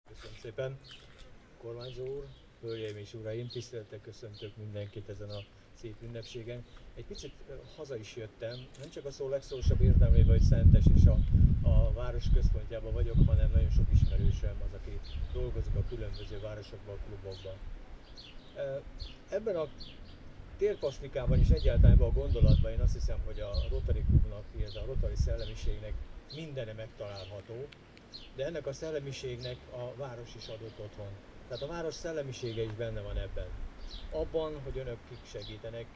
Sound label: digitar